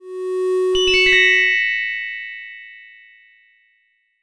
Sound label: level; tetrio